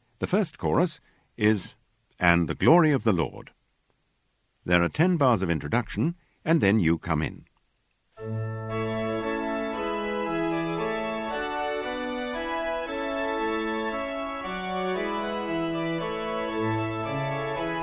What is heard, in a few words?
Alto; Messia with Singer